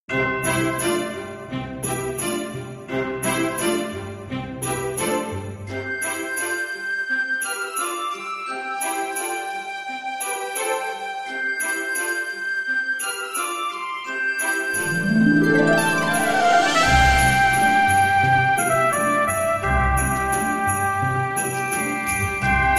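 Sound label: Christmas Background Music Loop; Pixel People; android ios gamerip 2013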